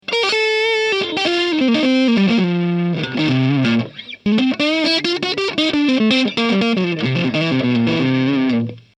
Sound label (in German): zerre stellung 4